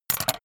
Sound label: scissors drop